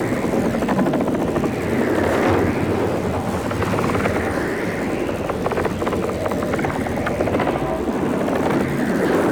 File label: Antimatter Portal Loop; Gameplay; Warframe; Nineteeen Ninety Nine; Deep Archimedia